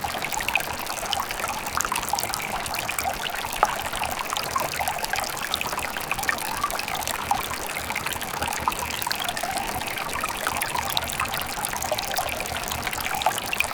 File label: gentle and soft water flo, river